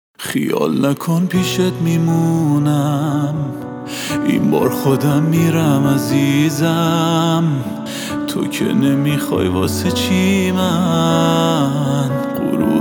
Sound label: Unplugged